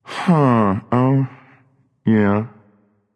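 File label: non verbal agree, voice; cernunnos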